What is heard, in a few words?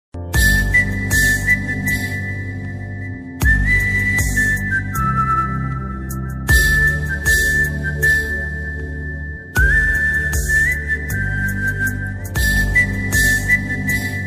whistle